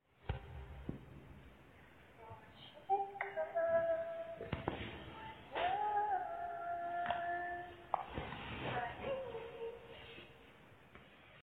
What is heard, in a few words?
horror ringtone; android